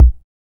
smooth bottom end kick sample b key; kick drum samples

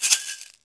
subtle reverb shaker sound G key; percussion

